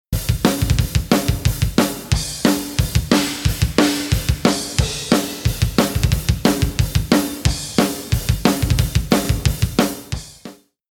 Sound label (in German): leichte Bandsättigung